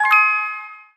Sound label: win; risehigher